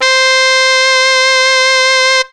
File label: sax, synths